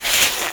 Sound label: snake back